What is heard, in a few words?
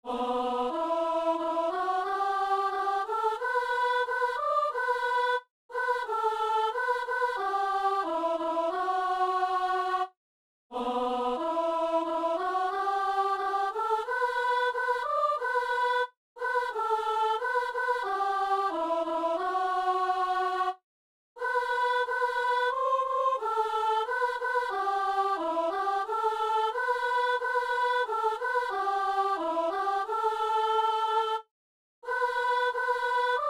soprano